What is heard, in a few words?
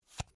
card flip